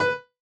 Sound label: chorus B5